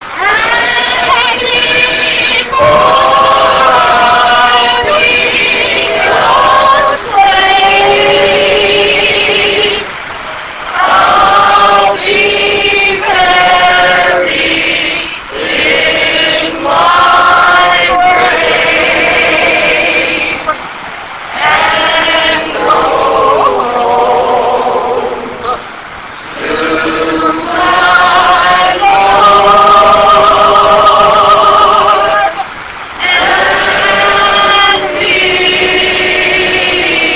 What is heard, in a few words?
choir